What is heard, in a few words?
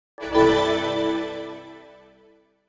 New Feature; super smash bros. ultimate expanded vol. 01 super smash bros.